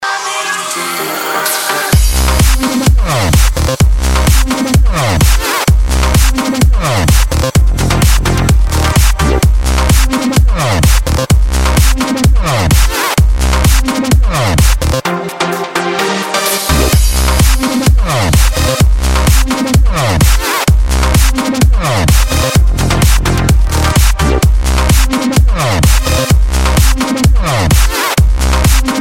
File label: Electro House Music